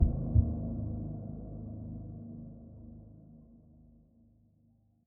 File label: Retry Jingle; Classified; Windows